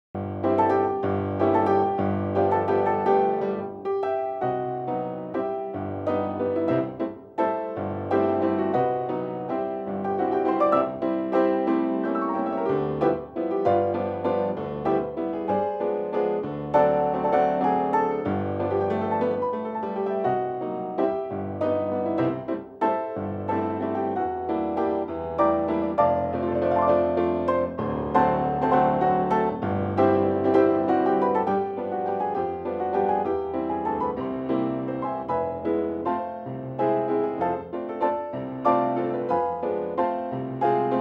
Grand Allegro 3/4

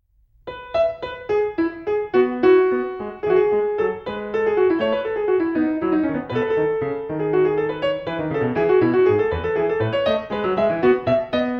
French Suite Gigue; Bach

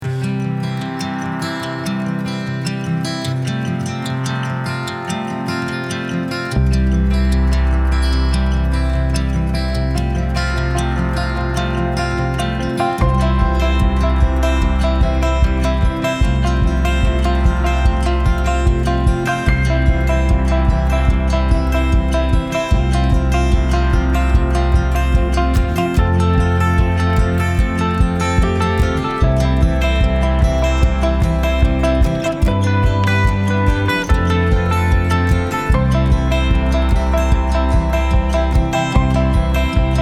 Thermos with piano; Uplifting Music (Acoustic w/ piano)

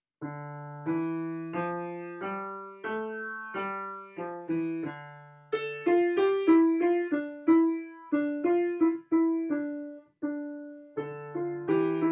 russian folk song

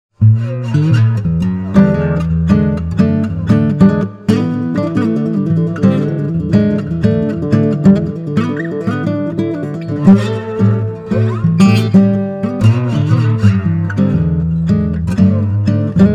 Walking Blues

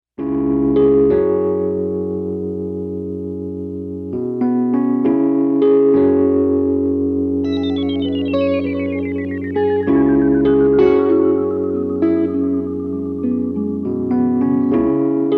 Space

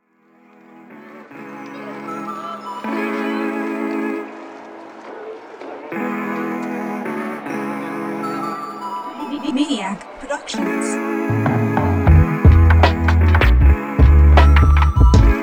Schoolyard Synth

